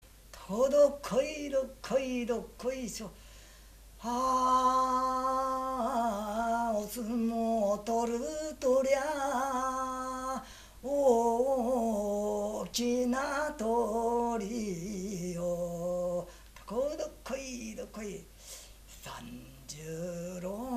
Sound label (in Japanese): sumo jinku; 館山市; 小原; 座興歌; 相撲甚句